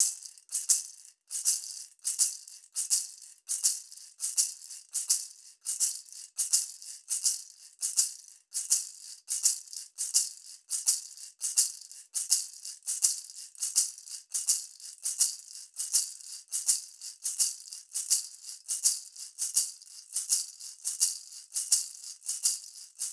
Small Shaker; Crow Hop (Weemmarategwa)

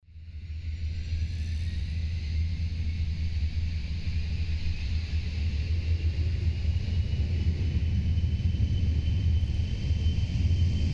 jet take off

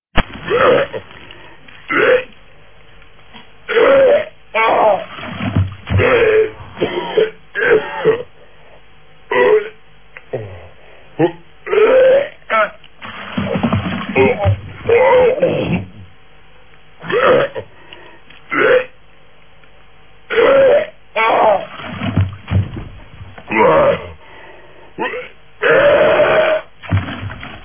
vomit

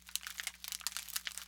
Cheetos soft; kontakt

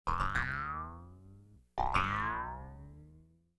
electronic twangs; music electronic; Asian Show Sound Effects

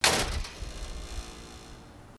Trunk Close

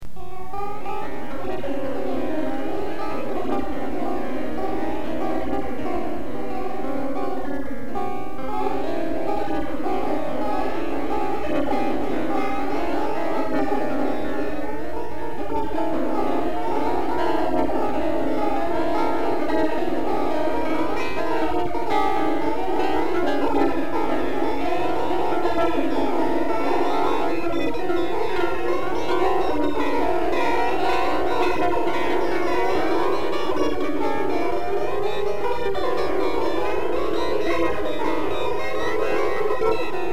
weird guitar thingy; This is a weird guitar thingy I recorded one evening

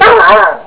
ckeilah barks

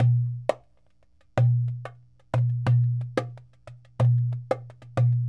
Dumbek

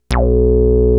micromoog

